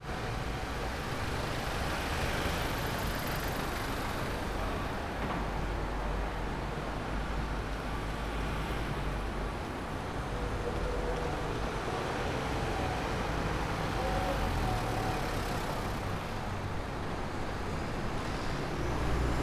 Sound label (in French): trafic